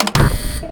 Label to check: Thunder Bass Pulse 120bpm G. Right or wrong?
Wrong